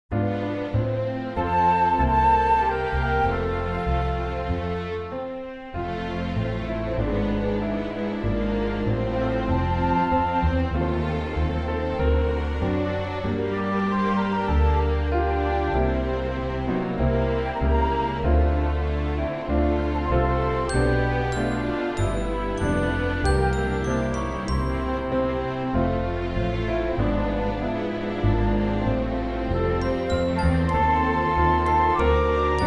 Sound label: orchestra no vocal